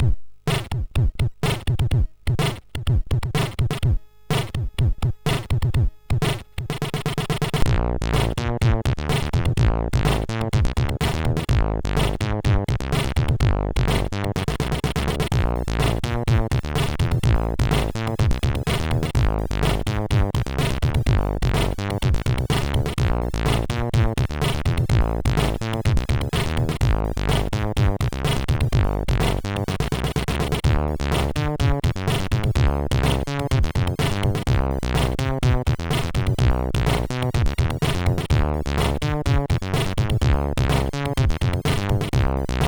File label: Acid Sadness, MOS6581R3